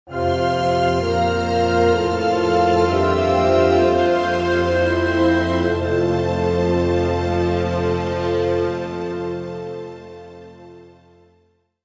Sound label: Modern Calm; Civilization Revolution